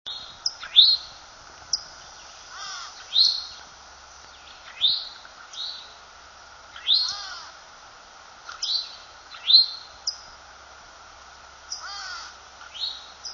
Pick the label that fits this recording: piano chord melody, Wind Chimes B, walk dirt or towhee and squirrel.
towhee and squirrel